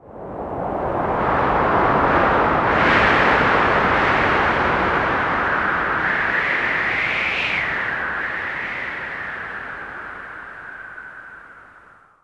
nature high wind; theodyssey

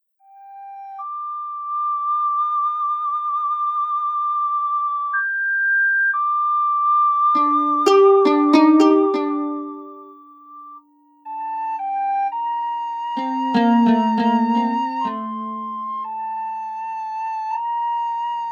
quiet flute; theodyssey

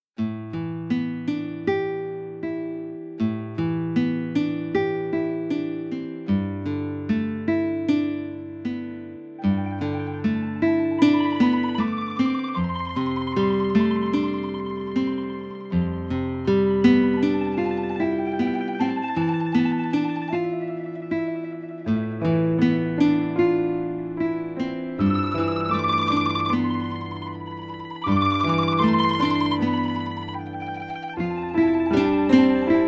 sad song slow; theodyssey

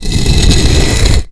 rock move; theodyssey

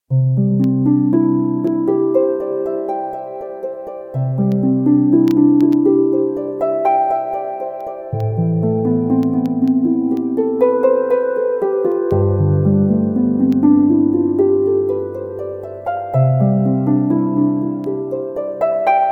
lyra music; theodyssey

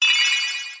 magic gling; theodyssey

